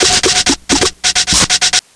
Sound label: dink